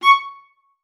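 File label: C#6, viola spiccato; Xsample VOL-5; 90 s Sample CDs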